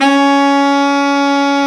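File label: baritone sax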